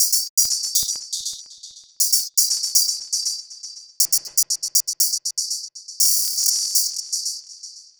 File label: Beat, Modular Electronica; Sonic Foundry (Sony Creative Software); TSS Autopilot